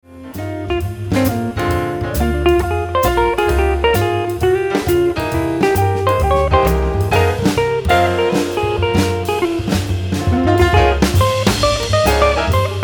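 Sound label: guitar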